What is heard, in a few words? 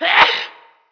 sneeze; darkfuture; scientist